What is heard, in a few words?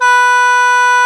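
B2 SHENG, CHINESE SHENG; AKAI S6000 CD-ROM - WorldSounds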